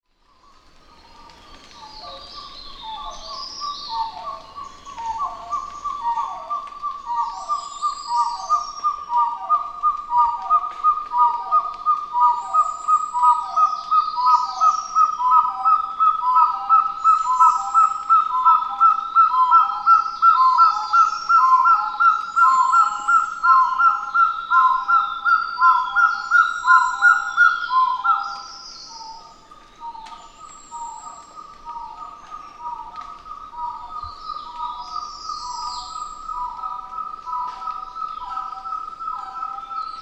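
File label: crimson-headed partridge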